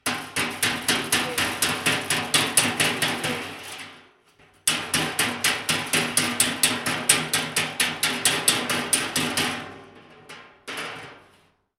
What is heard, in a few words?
Hammer Bodywork Fast; lynne-publishing